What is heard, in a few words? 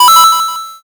coin